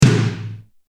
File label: 13 Rocktom; SONOR